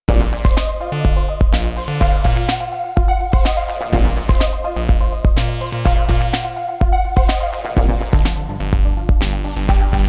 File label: Electrowerks, Korg Radias-R